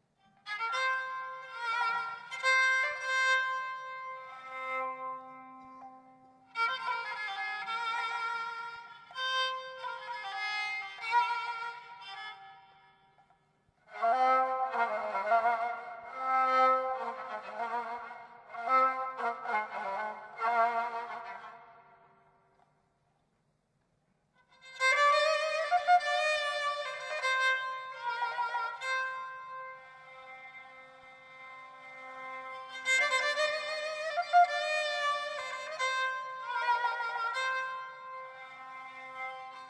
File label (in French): rebab perse; frottees